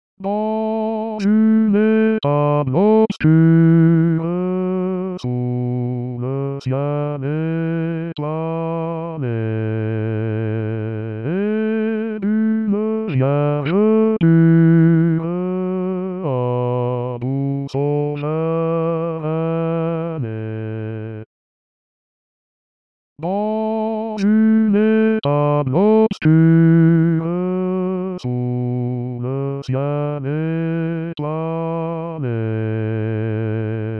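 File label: bass; praetorius; noel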